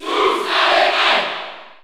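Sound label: Cheer French, crowd; Ike; SSBU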